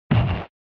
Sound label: SNES Action Impact